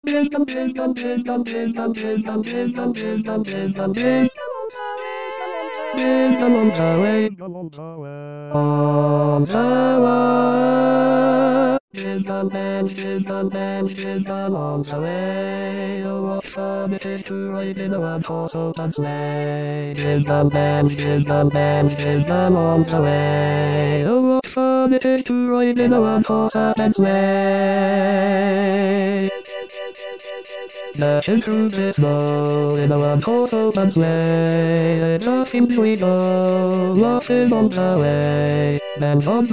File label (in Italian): Jingle Bells 6 voci bar